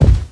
Jump Carpet Jump

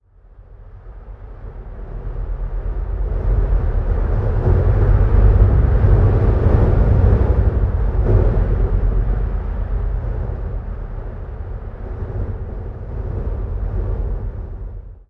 environment temple winds stinger outdoors

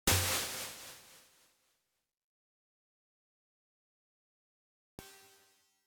Booktoss

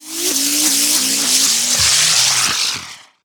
Fireworks Wheel Launch; angry birds rio

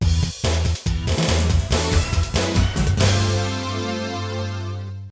Round Win; asuka 120 final burning fest 1999 playstation gamerip

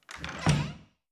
wood door close; adams venture origins; Kismet

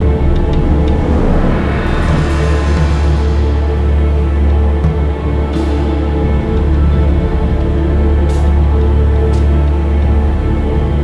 Siege Field Melody; Ninjago Monastery; lego universe macos windows gamerip 2010

